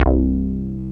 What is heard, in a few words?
MOOG, Synth Bass, Moog Basses